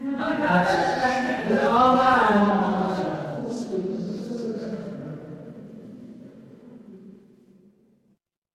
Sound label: crowd murmur